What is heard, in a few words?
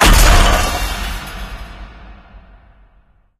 floor collapse; Source Engine; Portal 2